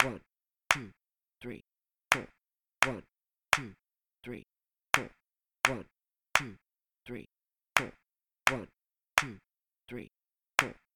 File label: clapping